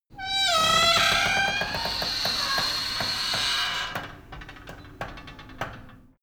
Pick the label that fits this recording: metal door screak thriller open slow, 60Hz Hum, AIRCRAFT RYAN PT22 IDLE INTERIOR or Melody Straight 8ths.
metal door screak thriller open slow